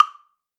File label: metronome